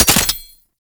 Gunbrella Mech; Weapons; Warframe; Tenno; Koumei

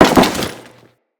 Crewman Land Default, Footsteps; Enemies; Corpus

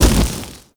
Veilbreaker Jetpack Thruster Burst A; Warframe; Vehicles

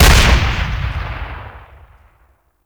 Fire; Hek

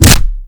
Generic Ability Impact; Warframe; Warframes

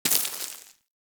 Bullet Impact Debris Dirt; Warframe; Weapons; Bullet Impacts

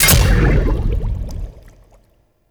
Maw Fishing Attack Impact; Warframe; Duviri

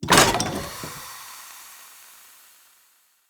Cage Open; Encounters; Animal Rescue

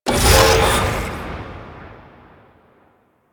Mods Station Enter; Interior; Ambience; Warframe; Nineteen Ninety Nine; Tailor Shop

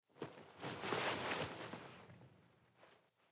Foley Emote Default Sit End, Default Clothing; Player

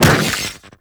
Proj Generic Whoosh; Warframe; Lizzie

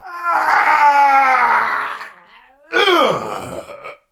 Death; Warframe; Tau; Dax; Ashigaru

